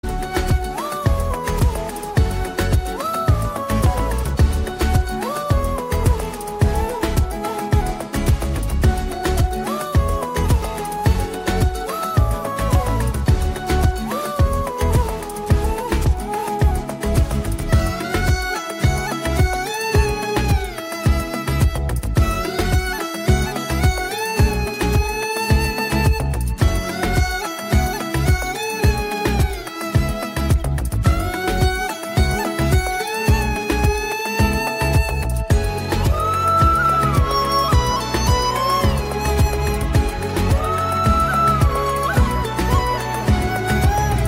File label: Instrumental Flute Bgm; Telugu